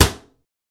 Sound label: Cabinet Door Slam, Close, Interior, Ambient